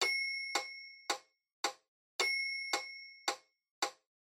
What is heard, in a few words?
Metronome High Bell Click Mid